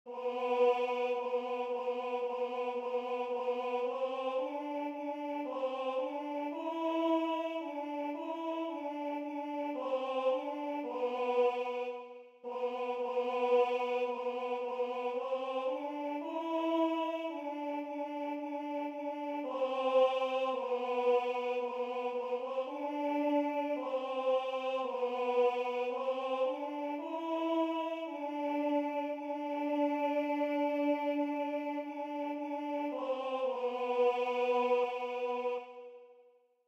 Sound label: Alleluia Tenore; Celebrazioni Eucaristiche; Base tenore; Alleluia (Messa del Papa)